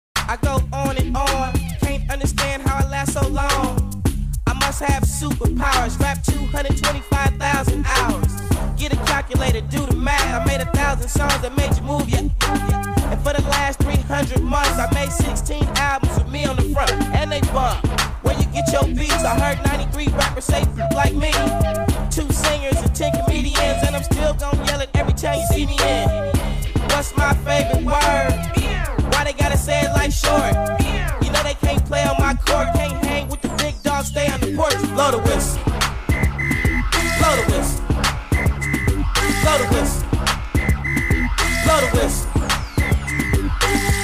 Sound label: Music